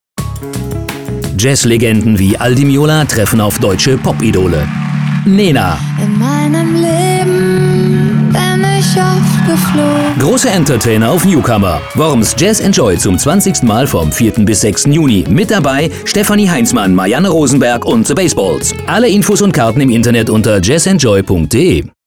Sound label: jazz joy spot